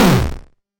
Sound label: cannon launch, platformer